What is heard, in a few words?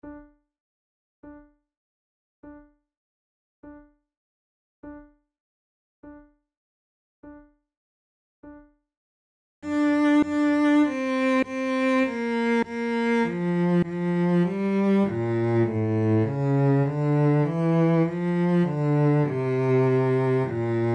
flute sonatas adagio; locatelli